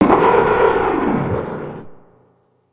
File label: cry